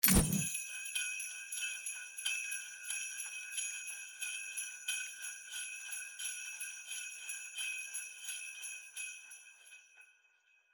Grenade Throw; Krampus Trap

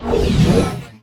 Barrier Down; Midfield; Sports

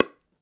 PickelballPaddle Hit Ball Light

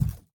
Hand Paddle Attack Extra Light

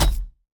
player mvt grab player

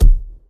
Ball Player Hand Hit Tackleball Hard

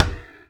Ball Hit Med Breathy; Spinal Serve

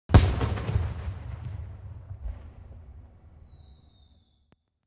Celebration Fireworks

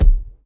Ball Player Hand Hit Tackleball Med

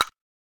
Quest Timer Tick; Quests

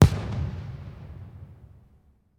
Fireworks

